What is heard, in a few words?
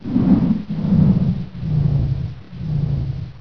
fan off; fans; Source Engine; bshift